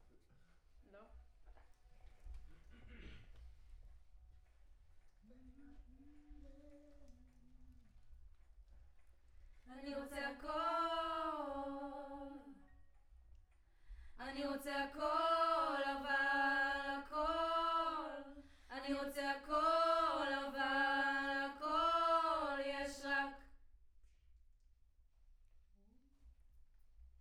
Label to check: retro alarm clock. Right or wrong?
Wrong